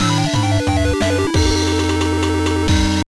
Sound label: Duel - Lose; Yu-Gi-Oh! The Eternal Duelist Soul; GBA